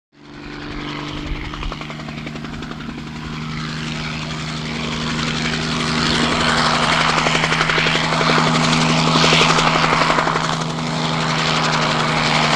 Helicopter; Hover / Land; Bell Helicopter General Flying Around, Eventually Lands And Motor Idling; jet ranger